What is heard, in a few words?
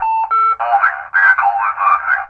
Electro FX; Ueb HE Electro FX